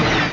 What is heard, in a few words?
star wars laser